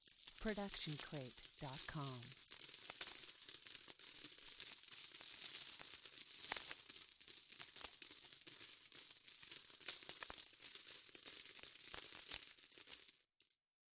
coins pouring; soundscrate

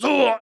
man injured grunt; soundscrate

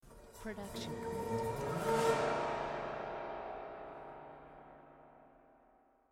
piano strum

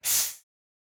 snake attack

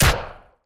Action Gun Shot

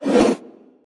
arrow swoosh single fast

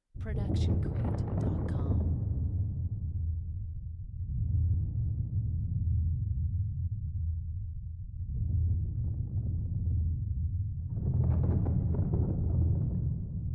Thunder; SoundsCrate

